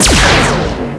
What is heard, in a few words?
teleport; de rats theatre